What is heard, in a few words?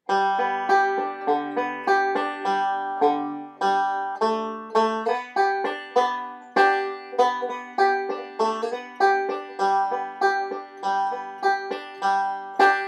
100bpm